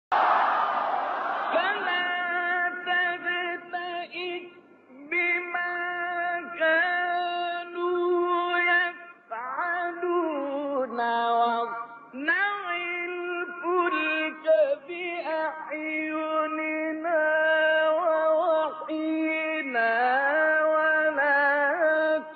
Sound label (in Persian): تلاوت آیه سوره هود; آیه 36 37; استاد عبدالمنعم طوخی